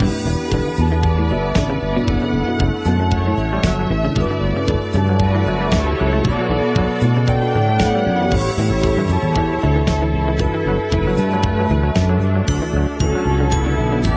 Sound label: bass loop